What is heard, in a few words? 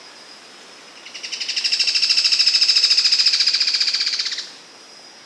Philydor novaesi song spontaneous; 700 m Jaqueira-PE